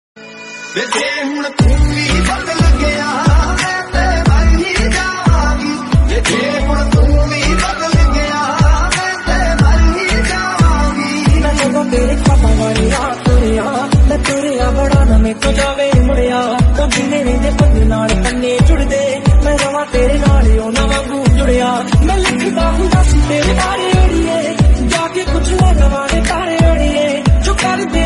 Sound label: punjabi